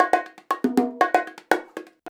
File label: 119 bongo; Soundscan vol.56; Modern Percussion Loops; AKAI